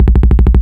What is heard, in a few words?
loop vz37 bass; guns tanks; War Thunder